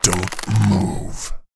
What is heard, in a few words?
human thief attack bow special; World of Warcraft